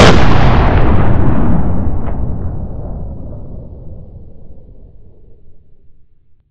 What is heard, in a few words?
explosion; explosions